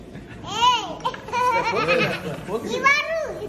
laugh

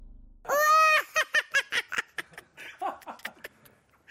laugh

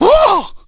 Hit; forest law

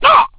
Hit; forest law

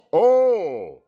ohhh

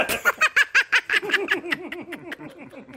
laugh

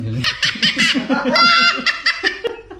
laugh

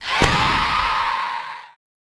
Death, faerie dragon; warcraft3

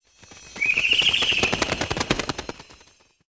Death, owl; warcraft3; Scout

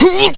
Hit; bryan fury

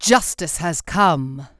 Warcry; warcraft3; Warden; Hero

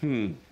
hmmm

